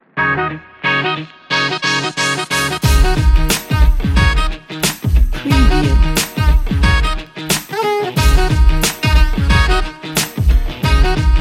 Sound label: good vibes